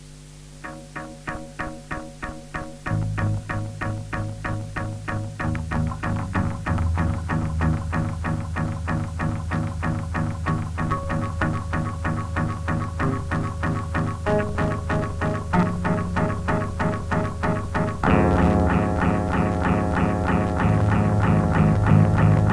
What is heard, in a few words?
Bass Loops